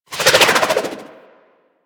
SMG Projectile By; Weapons; Warframe; Tenno; Sundial

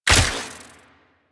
Shot; Warframe; Weapons; Infested; Inf Cernos; Prime Derelict Cernos

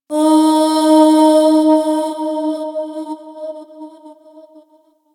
Opera Note; Warframe; Ambience; Orokin Moon; Moon Int Opera House

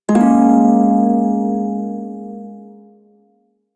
Prime Shawzin Phrygian Chord L; Music; Warframe

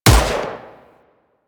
AI Rifle Shot; Warframe; Weapons; Corpus; Crp Ruban Rifle

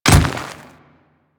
Dirt Step, Footsteps; Warframe; Grineer Royal Guard

